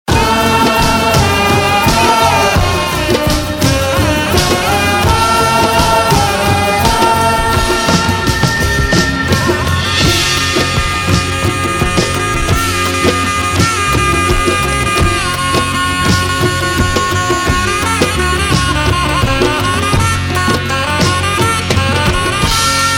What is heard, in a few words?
Music Ringtone